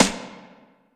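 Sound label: snare drum, Drum & Bass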